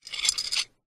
ancient game metal thin strip twist sequence squeak crank gear open slide texture